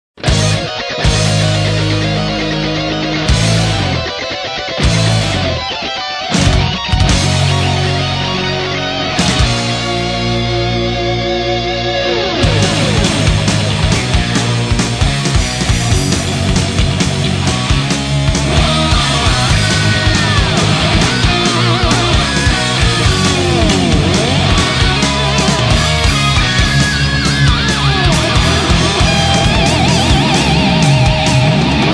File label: Anvil Chorus